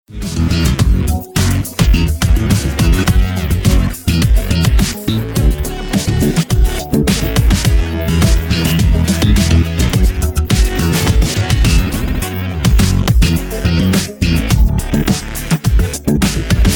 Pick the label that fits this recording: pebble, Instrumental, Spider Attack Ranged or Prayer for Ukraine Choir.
Instrumental